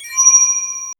soft, sword

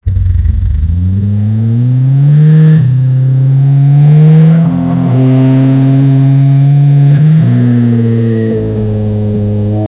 sports car revving engine

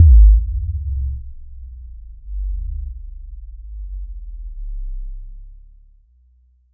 deep sub bass thump very; universe

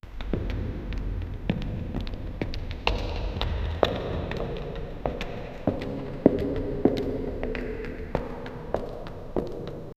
create a sound that is, corridor

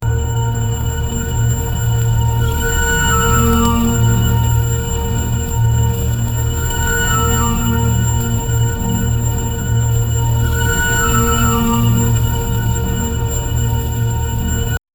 revelation an awe in, awe

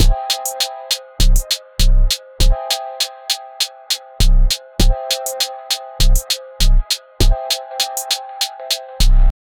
urban pulse drop trap, beats

